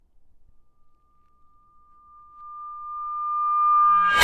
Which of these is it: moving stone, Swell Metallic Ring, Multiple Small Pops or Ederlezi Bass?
Swell Metallic Ring